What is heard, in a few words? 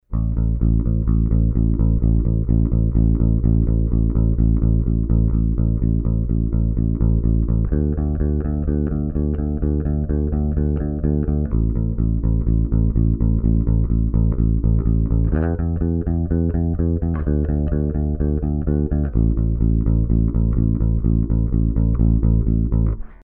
BASS